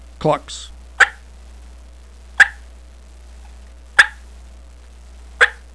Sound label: world clucks, turkey calls; World Champ